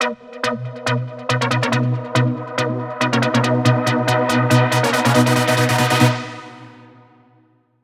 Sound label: 140BPM B; Vengeance - Essential Clubsounds Vol.4